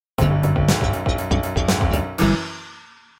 Rock; Rockfive

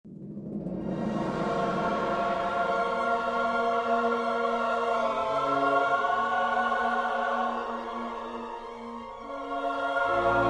Mus Underwater Amb; Star Wars Galaxies: An Empire Divided

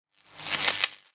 switch metal cube; gamerip; Through Abandoned The Underground City

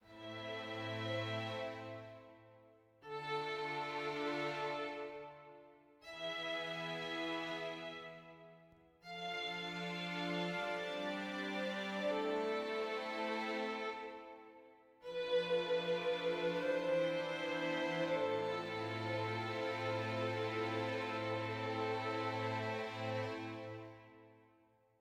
sad med; Quivr; PS4 Windows gamerip 2016

